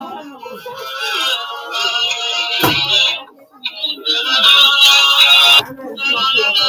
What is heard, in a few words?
very sad song